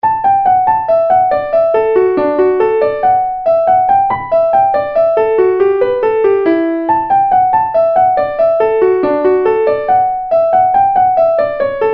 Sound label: Belfast Hornpipe; Piano